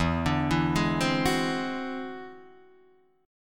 E Augmented 9th E down Guitar Open D

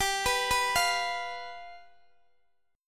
G 7th G down Guitar DADGAD

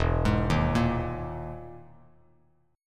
E-Major Sharp 11th E, Bass Standard; EM#11 arpeggiated; JGuitar

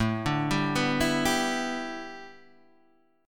A 9th Suspended 4th Guitar Open D, chord

